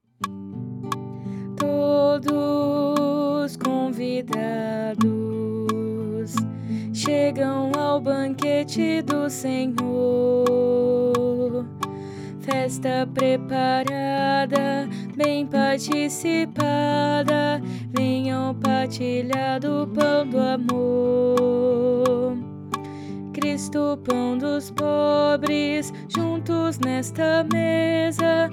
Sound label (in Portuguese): Contralto